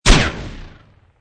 Explosion Grenade Flash Bomb Close, Sharp, close explosion blast as a grenade or flash bomb; Bombs